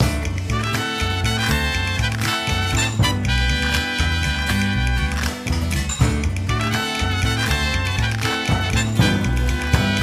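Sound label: food world music inferno no vocals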